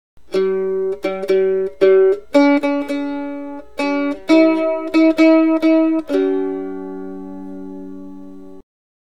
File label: mandolin music